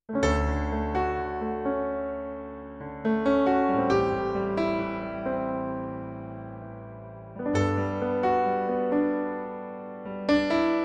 grand, Roland RD 700 GX; demo roland rd 700 gx kit supernatural

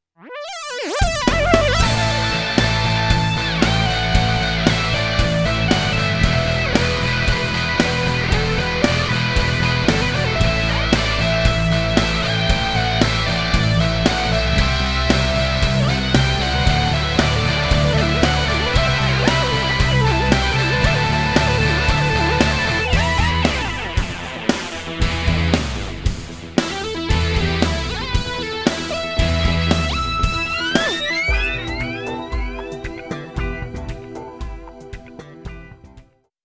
Korg i4s; korg i4s-lead

